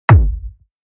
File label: E-Kick single